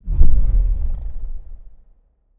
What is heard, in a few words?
Core Heart; Game rip; MiSide